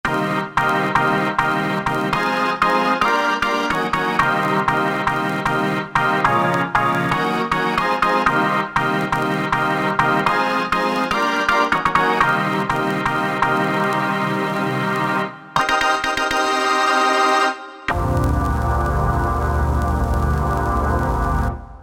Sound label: Organ Hit